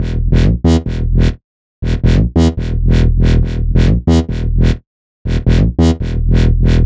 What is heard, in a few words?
140 E BASSLINE, Dubstep